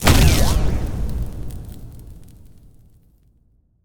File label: Heartbomb Explode; smite; god cupid skin13